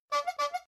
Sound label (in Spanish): Bocina